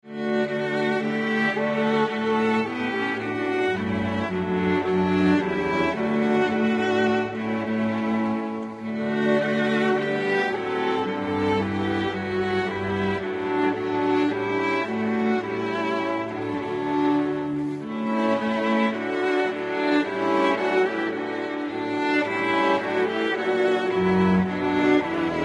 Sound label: Ode to Joy violin